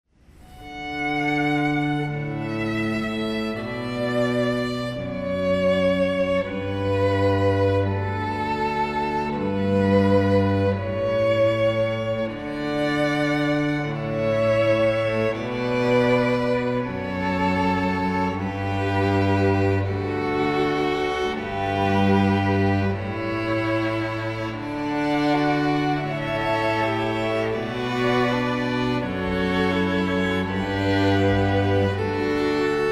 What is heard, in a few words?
pachelbel canon